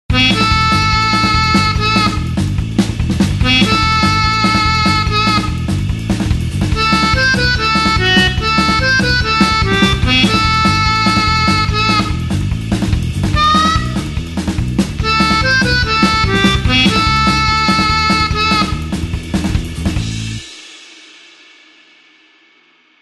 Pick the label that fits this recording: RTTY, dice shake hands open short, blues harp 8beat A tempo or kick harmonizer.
blues harp 8beat A tempo